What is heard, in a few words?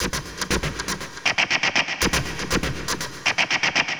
Rhythmic (120BPM); musicradar